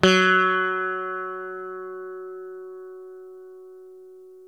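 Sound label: SITAR F#2 left, coral sitar, guitar; East West; Steve Stevens Guitar Samples Collection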